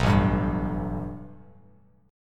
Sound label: A 7th Suspended 2nd Bass Standard; A7sus2 strummed; JGuitar